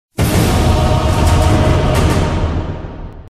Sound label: match found; cave